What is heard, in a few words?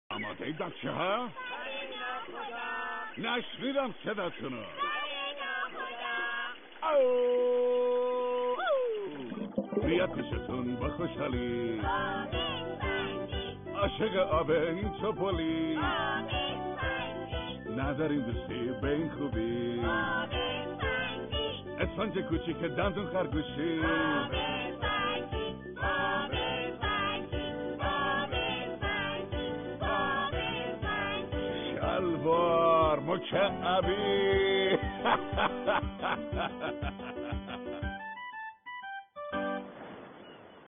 music; cartoon; sponge bob